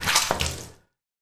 household flannel wet rinse squeeze drips into bathtub slight distance room reverb